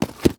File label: Latex Gloves Box; Blastwave